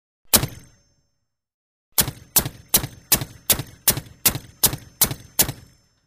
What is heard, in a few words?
Tank machine gun shots